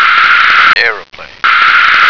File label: aeroplane